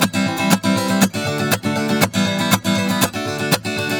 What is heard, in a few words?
GUITAR SALSA